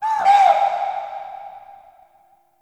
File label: Deer Ruckus Vocal; gameplay; Warframe; Entrati Labs